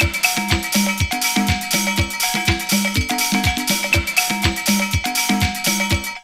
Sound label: drumloop